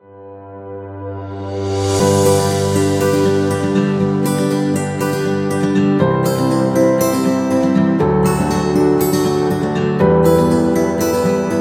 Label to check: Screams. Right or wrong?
Wrong